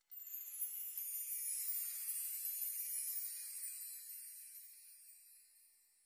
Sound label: sparkle sound effect